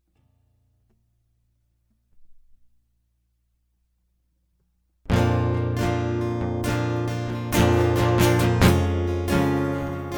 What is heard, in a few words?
Guitar Loop